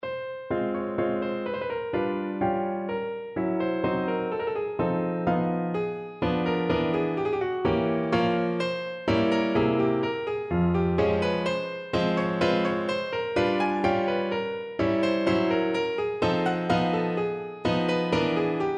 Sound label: mazurka, piano; op68 no4